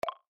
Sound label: notify; livechat